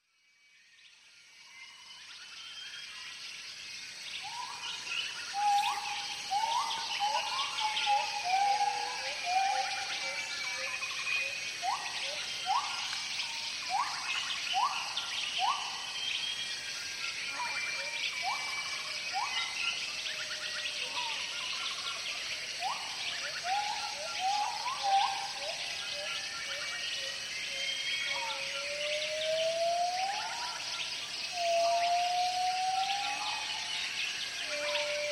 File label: tropical forest soundscape, Taiwan’s